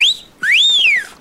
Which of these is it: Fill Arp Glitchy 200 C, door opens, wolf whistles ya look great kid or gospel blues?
wolf whistles ya look great kid